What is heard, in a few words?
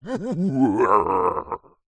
fantasy giant laugh